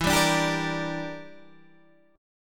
E Minor 7th Flat 5th E, Guitar Standard; Em7b5 strummed; 12,10,12,12,11,10

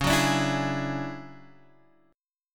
D Major Sharp 11th C sharp, chord

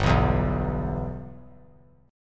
G 6th; G6 strummed

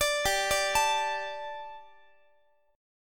G Suspended 2nd G, down, Guitar; Gsus2 arpeggiated; JGuitar

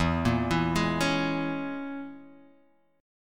E 6th Flat 5th E down Guitar DADGAD

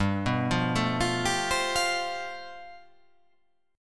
G 11th

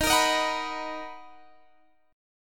B Major 7th Eb

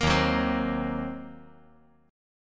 D sharp Minor 6th D sharp down; strummed; JGuitar

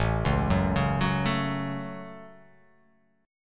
A 6th, guitar

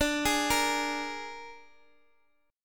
Ab Suspended 2nd Flat 5th D

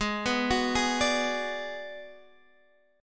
G sharp Minor 7th Flat 5th, Guitar Drop D; x,11,9,11,9,10

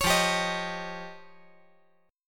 F 7th Suspended 2nd Sharp 5th

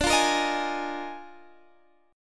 Eb Major 7th Suspended 2nd Suspended 4th D

